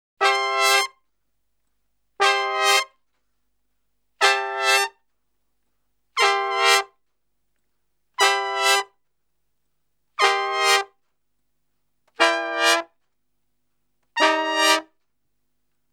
Salsa Picante; Masterbits; World Zone VOL-3

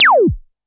korg trident

